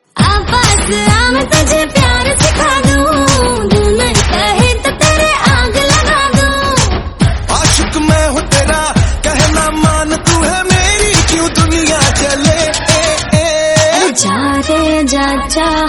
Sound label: Hindi Ringtone